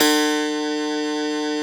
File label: Piano E0